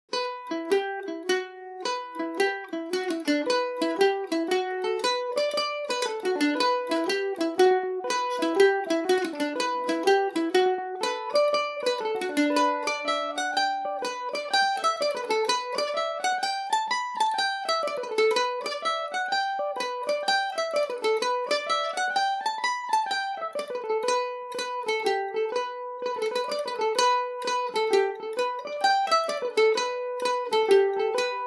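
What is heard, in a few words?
butterfly E minor, Irish mandolin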